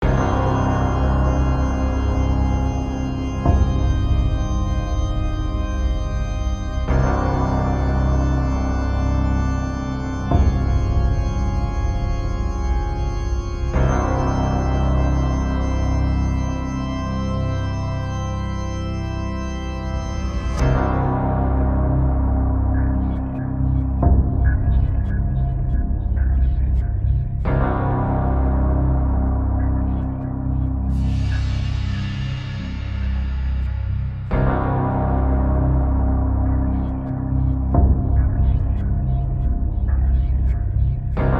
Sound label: Music Battle result lose; Bloodmasque